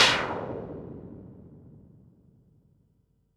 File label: Perc, Percussion and FX; Pearl SC-40